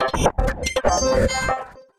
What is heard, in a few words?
Piano & Keys; Renoir